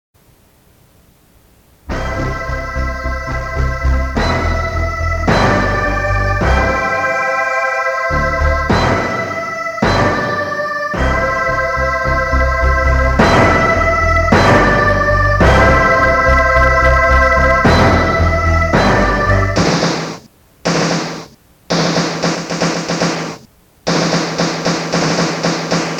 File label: SYNTHESIZED ANTHEM